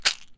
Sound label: Vitamin D - Shake, Pill Bottles